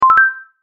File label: Bonus